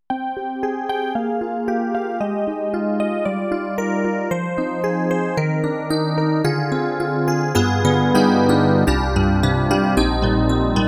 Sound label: Solo FM Hard EP Brite FM EP; SD-90; EDIROL